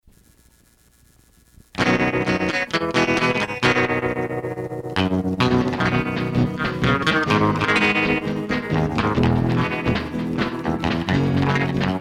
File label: Instrumental